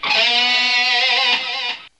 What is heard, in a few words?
GUITAR, POWER GT